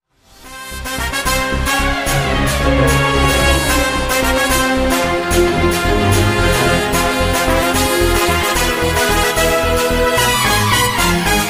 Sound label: ganpati bappa morya ringtone danka baja instrumental ringtone